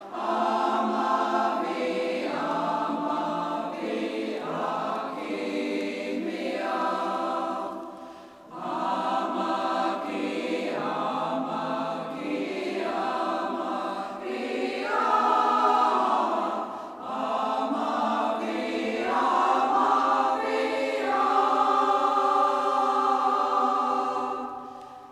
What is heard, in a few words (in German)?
cantilena, maennerquartett